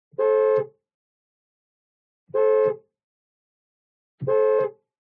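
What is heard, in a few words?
SPORTS CAR Interior Horn Single; TRANSPORTATION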